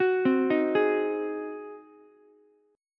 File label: Ab 7th Ab down Ukulele; Ab7 arpeggiated; JGuitar